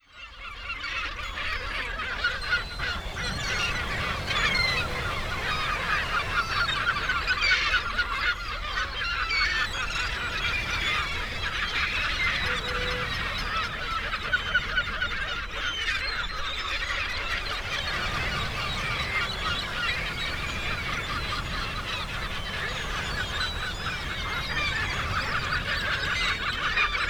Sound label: Sea Birds Playa; marcas liminales escuchando los bordes